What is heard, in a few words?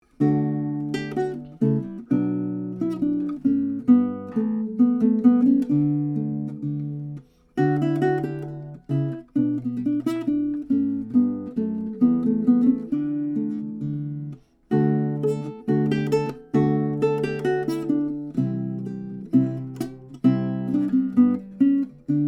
Kemps Jig, Mazarand Romantic Mirecourt Guitar Dated 1808; Baroque Guitars; Kemp's Jig (English Renaissance)